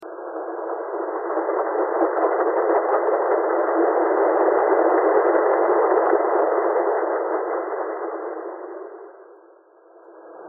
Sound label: thread pentatonic